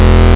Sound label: Moog Bass Hit